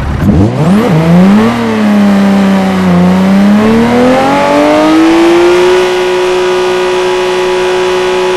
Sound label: rev, vehicles cars; tdmcars; r34